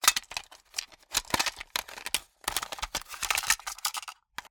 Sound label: Cassette Tape Box Handling